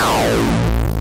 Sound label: bass drop